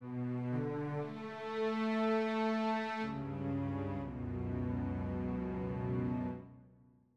lose music

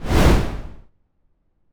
foom

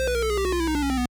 fail; Chiptune SFX Pack

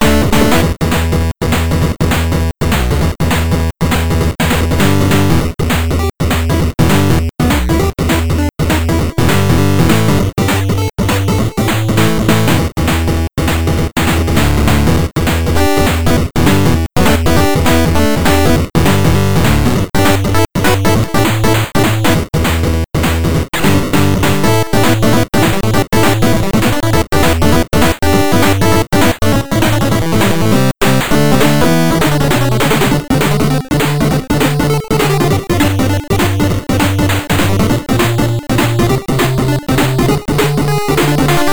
Funny Chase (8-bit chiptune)

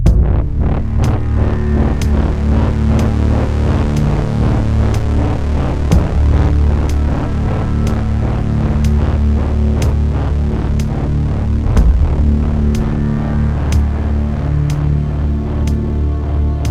hostile territory loop

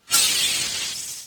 Insect or alien scream; Fade it, flip it, slow it, shift it, slow it, normalize and save it.

